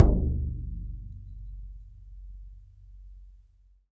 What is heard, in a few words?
Bodhran, Ireland